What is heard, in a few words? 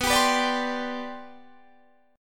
C Major 7th Suspended 2nd B, chord